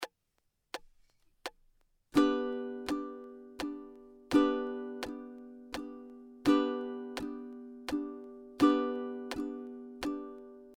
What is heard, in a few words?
Downstrum in 3 4, ukulele